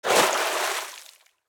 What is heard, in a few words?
Water Splash; Idle Champions of the Forgotten Realms